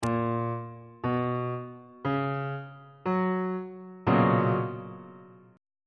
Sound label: b major 7th suspended 2nd 3rd inversion on bass clef